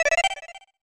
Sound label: Reward Fanfare; Jetsons Invasion of the Planet Pirates; SNES